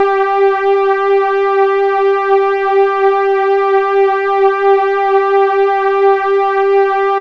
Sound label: SYNTH, G4; MATRIX; AMG; Global Trance Mission VOL-2